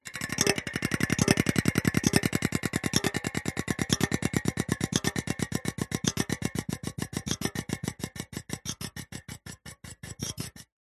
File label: vehicles bicycle pushbike wheel spokes slow down by finger clicks; zapsplat